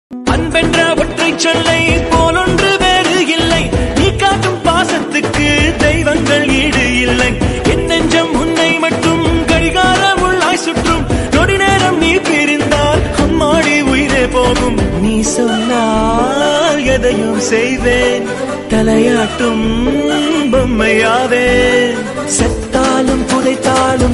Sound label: ringtone, Tamil; akka thambi